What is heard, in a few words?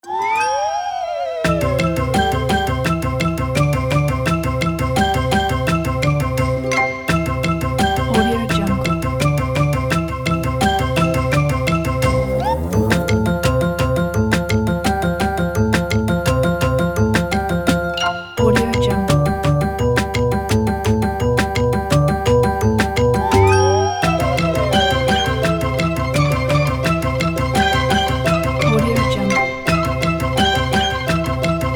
fast cartoon staccatos